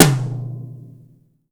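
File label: power drum tom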